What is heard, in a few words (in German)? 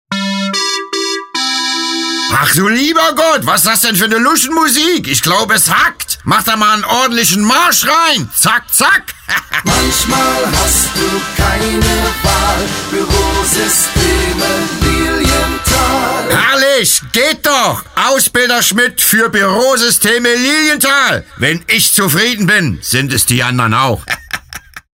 marsch; spots ausbilder schmidt